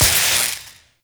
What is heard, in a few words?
Vial Ability Shock Shatter; Warframe; Warframes; Alchemist; Elements